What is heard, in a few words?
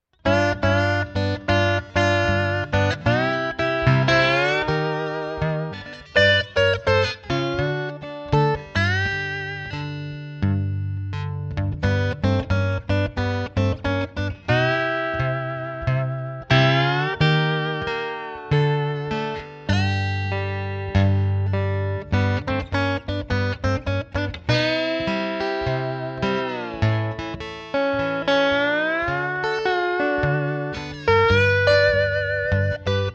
epiphone slide